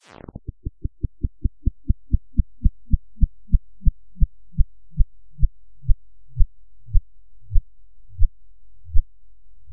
teleport postblast winddown; Garry's Mod; hl2; labs

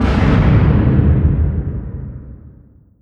distance ship hit big effect; Hearts of Iron IV Death or Dishonor

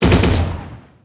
mgun tower; Emperor - Battle for Dune

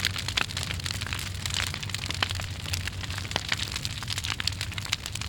Fire Small Crackle; Mount&Blade Warband

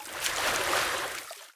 wade, footsteps; Garry's Mod; hl2

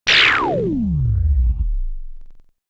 long laser; Sid Meier's Civilization 4 Complete; Beyond the Sword; Final Frontier; Units

